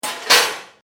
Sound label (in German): Autowerkstatt Werkzeug